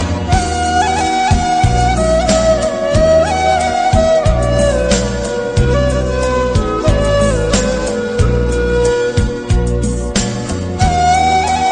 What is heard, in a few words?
turkish flute, ringtone